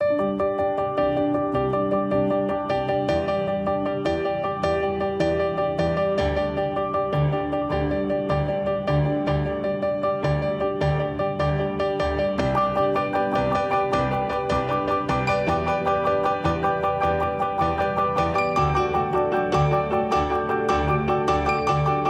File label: playful piano, alarms; Google; Google Sounds; Play It Loud